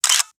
camera shutter click; urban sounds

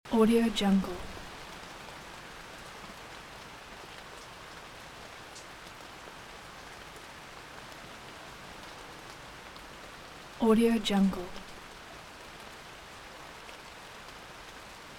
rainstorm in nature loop; nature sounds

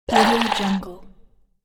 zombie breathes; human sounds

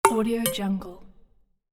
interface accept plucks metallic; interface sounds

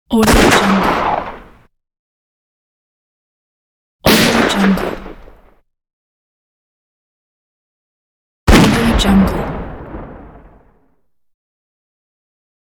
gunshot sound effect

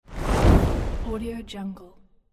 fire flare; زبانه آتش

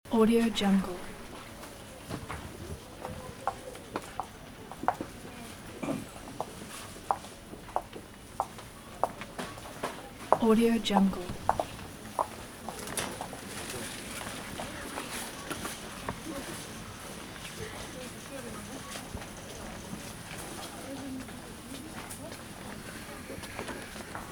people at marketplace loop; urban sounds

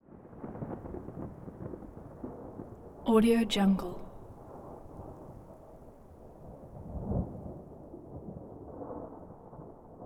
thunder; رگبار رعد و برق; nature sounds

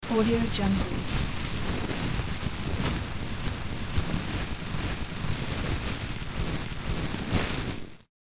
foley plastic stretch foil roll large; domestic sounds

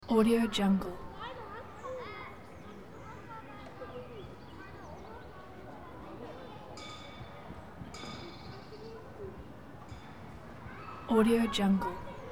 urban amusement park children people

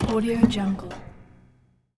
microwave oven door open close movement; domestic sounds

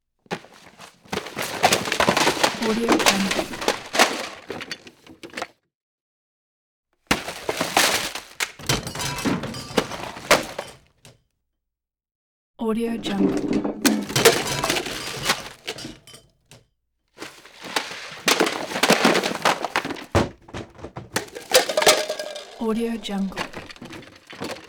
earthquake debris; آوار زلزله

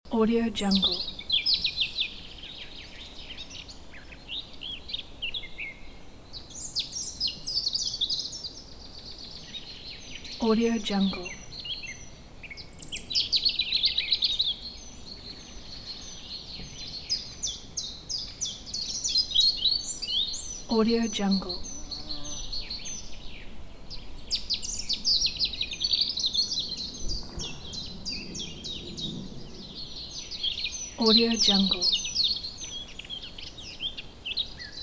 nature birds in woods loop

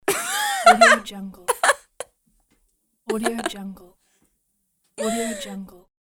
female teen laugh; human sounds

